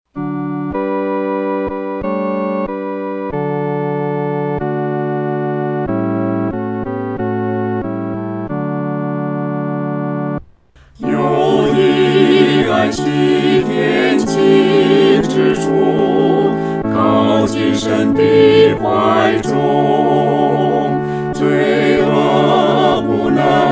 合唱 四声部; 靠近神的怀中

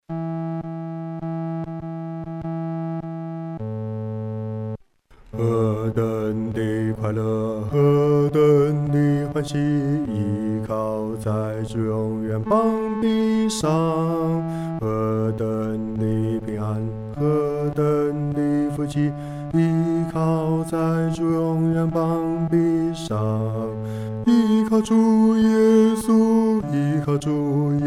依靠主永远膀臂 独唱

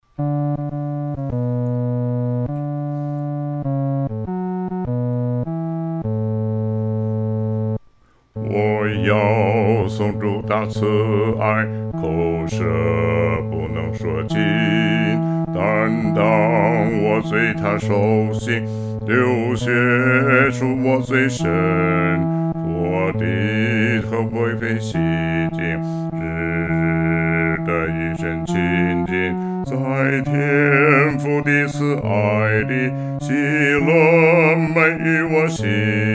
独唱 第四声; 颂主大爱

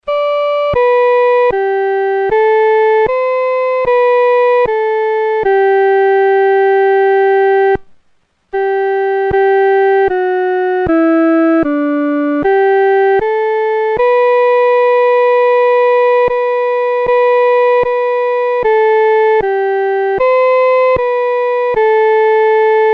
独奏 第一声; 三一颂

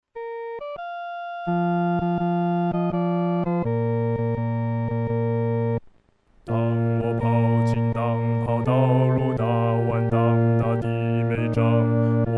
独唱 第四声

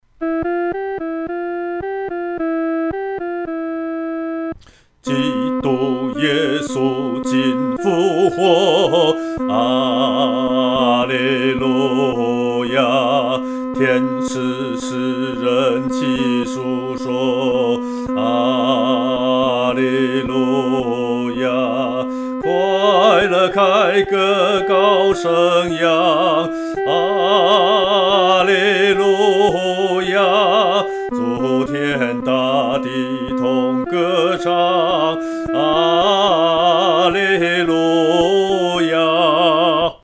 独唱 第二声; 基督今复活

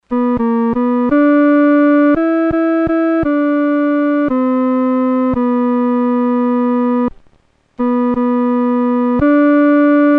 独奏 第二声

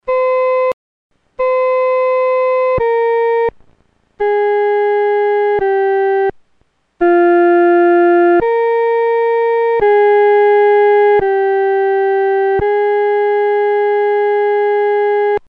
阿门颂 独奏（第一声）

